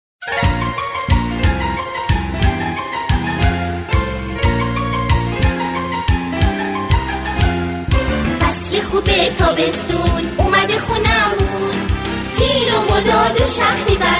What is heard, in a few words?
Happy children's song